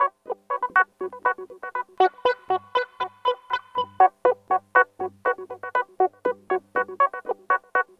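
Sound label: Guitar 120 B; Zero-G Kocktail Kollection; Adrennalinn 2fers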